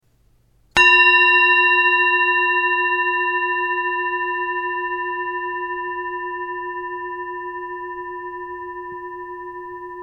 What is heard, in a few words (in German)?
Klangschale